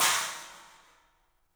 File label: CYMBALS; 3D Sound; 3D Drums